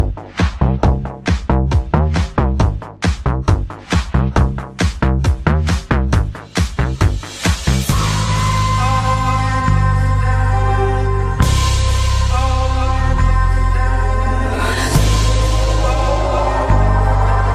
Samsung Galaxy Note Dubstep

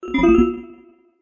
Vivo SMS Ringtone; Y100I

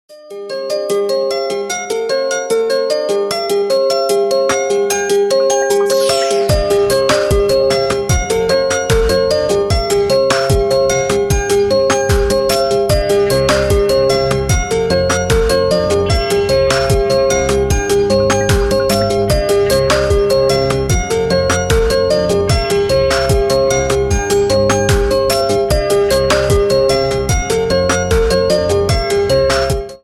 Vivo X100 Pro Ringtone

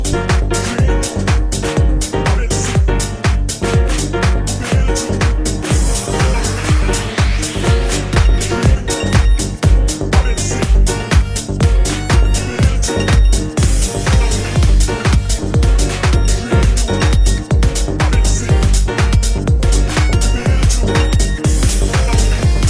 Samsung Galaxy M31s

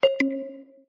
Notification Ringtone; Vivo Y100I

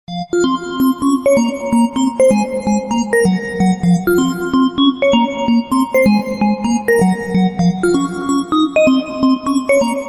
Vivo Y78T Ringtone

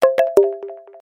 Sms Ringtone; Vivo Y17S

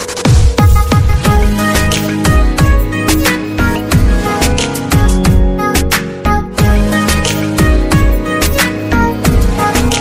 Samsung Galaxy S11

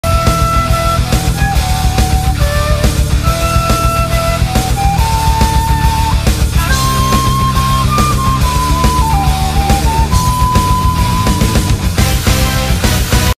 Ringtone Flute; Naruto

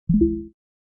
Realme C67 4G SMS

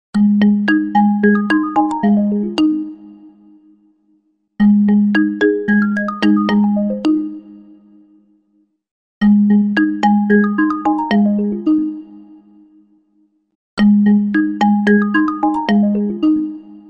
Vivo S18 Ringtone

